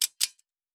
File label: Metallic Gear